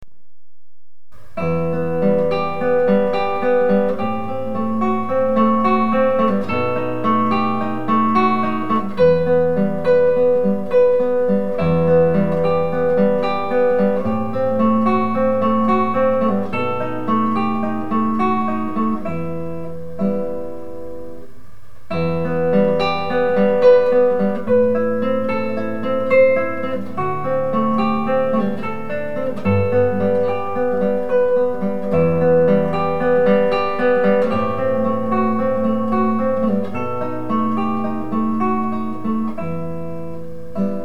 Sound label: Etude Em